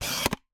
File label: Camera Single